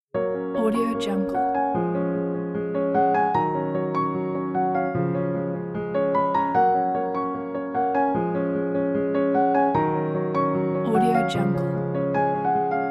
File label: uplifting piano melody; sternalex